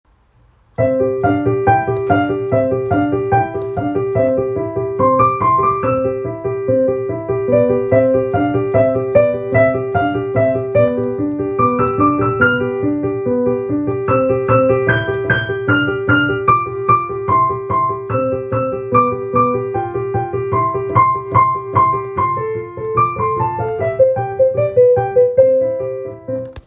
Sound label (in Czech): Etuda